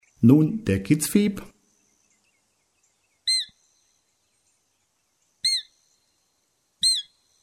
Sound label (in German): Kitzfiep